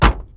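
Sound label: door close; Mafie 1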